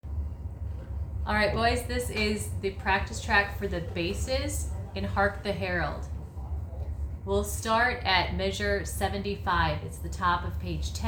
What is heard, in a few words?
bass, youth choir; Hark the Herald